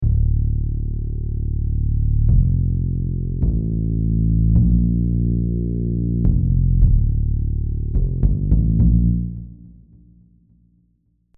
serum basses; chiqui audio

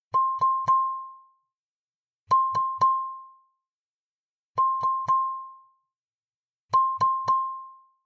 kalimba sympathetic res

